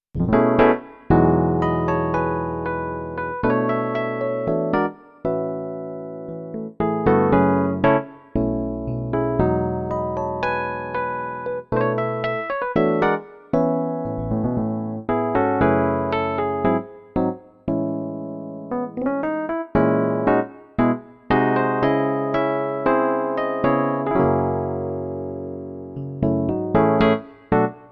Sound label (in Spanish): Yamaha p45 Teclado Electrico